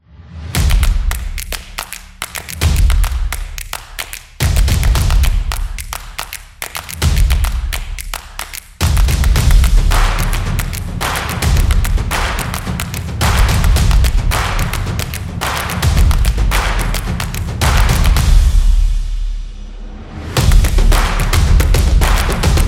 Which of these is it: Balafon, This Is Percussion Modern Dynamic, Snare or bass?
This Is Percussion Modern Dynamic